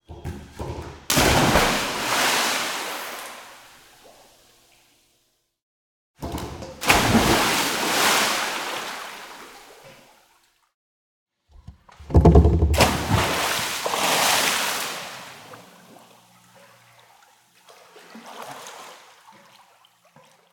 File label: Splash Dive; Water; Soundopolis